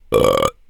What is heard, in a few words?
burp; shweets sim; braixen